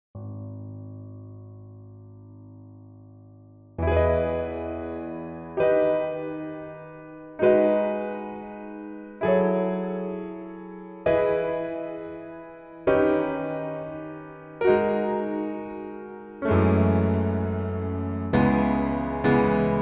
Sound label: Piano